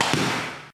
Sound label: door close; Gachitale